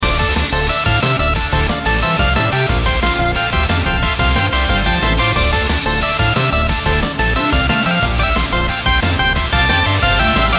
Miniboss (180bpm); 30XX

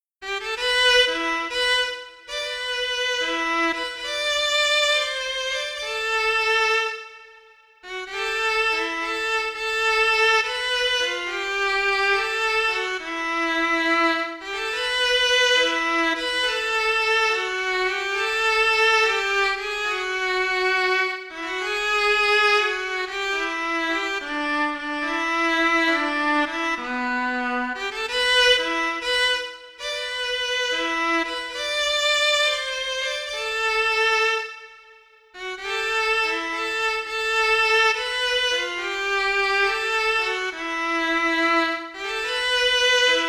Dungeon Keeper's Violin; Shadowgate 64 Trials of the Four Towers

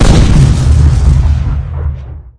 Weapons Rocket Launcher

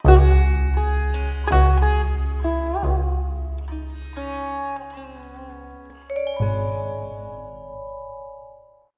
Bari Sax

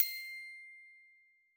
digging success; Scrat

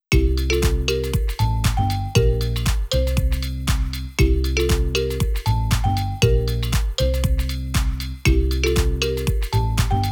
Whistle; Saenai Heroine no Sodate Kata Blessing Flowers

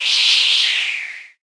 Btl Actsrng Roll; The Denpa Men; Android

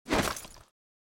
grenade throw; out of reach treasure royale 2020 gamerip pc

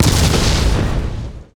Explosions Big Explosion

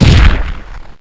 Megakick; pokemon reborn e19 2022

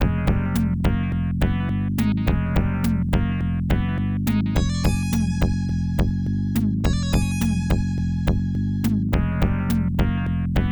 Prelude to Boss; Dynamite Duke; genesis

